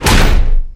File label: door checkpoint close, doors